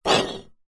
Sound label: food drink can soda empty push slide table